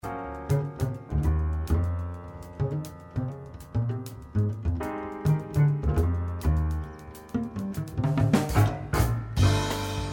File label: jazz trio